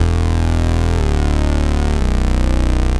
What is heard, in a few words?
Hyper Saw Bass; Sonic Foundry (Sony Creative Software); The Electronic Music Manuscript Collection; Bass Foundations